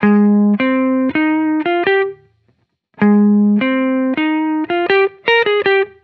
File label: Electric Melody Loop - 160 BPM Ab Min; Cymatics